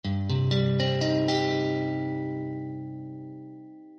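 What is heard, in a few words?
C min, Guitar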